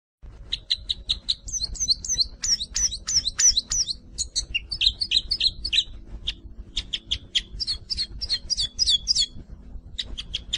Canto do cruza bico

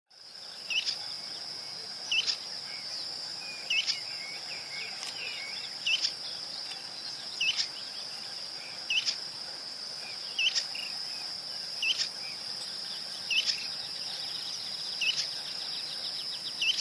canto do papa moscas de costas cinzentas

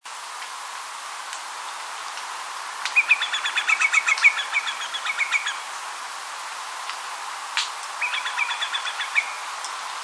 canto do macarico de bico torto

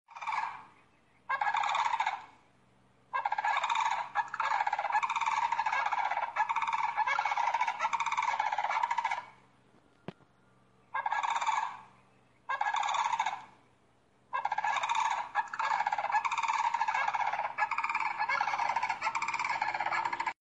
Canto do grou coroado

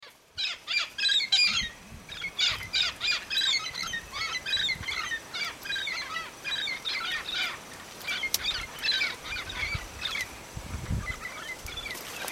canto do papagaio charao

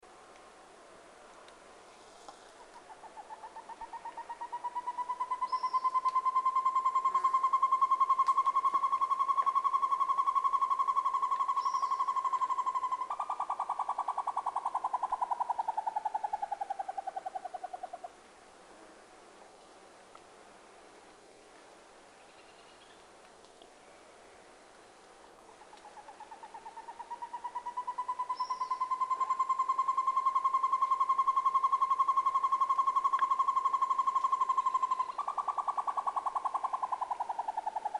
canto do tovaca campainha

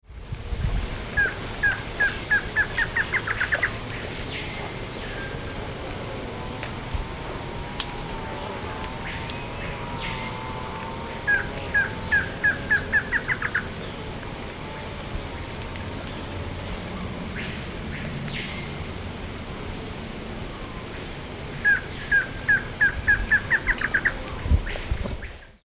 canto da choca bate cabo

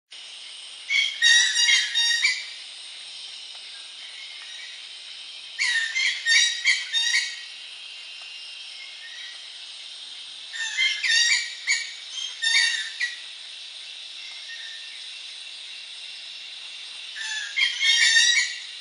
canto da tiriba de barriga vermelha

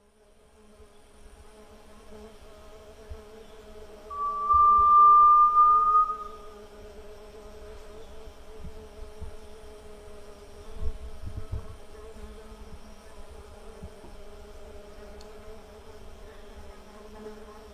canto do Inhambu de pe cinza

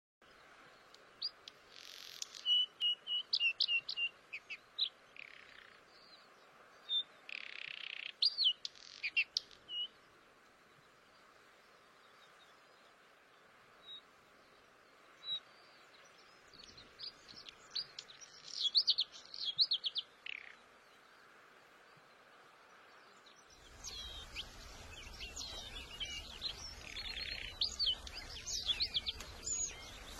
canto do pisco de peito azul

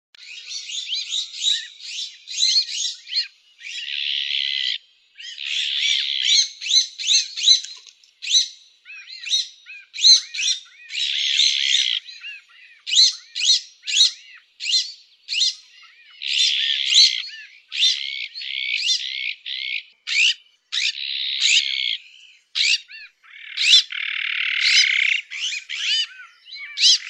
Canto do pega azul

